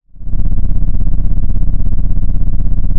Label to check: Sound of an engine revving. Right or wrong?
Right